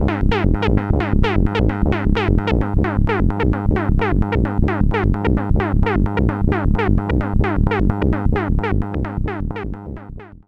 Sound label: Sonic Six, synthesizer